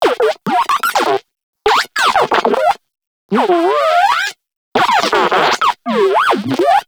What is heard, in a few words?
Moa Compassionate Vocal Interact Stand Forward; Warframe; Pets